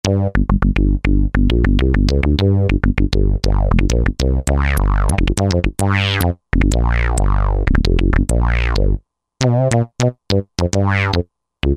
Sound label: Funk Bass, Roland JD-990; Factory Patches